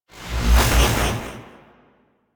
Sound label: Transmission In Head In; Transmissions In Your Head